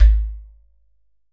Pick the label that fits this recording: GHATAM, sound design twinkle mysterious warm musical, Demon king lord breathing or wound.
GHATAM